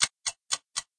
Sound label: clock